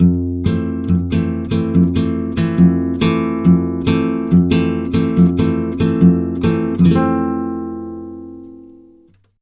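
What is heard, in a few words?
140 guitar F7, Brazil Bossa; USB Soundscan; AKAI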